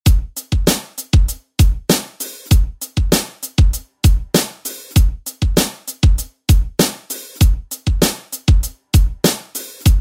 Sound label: Quick Kutz; DJ Mhark